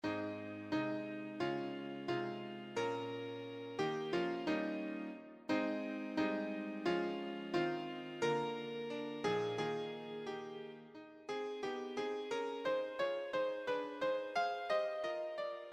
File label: piano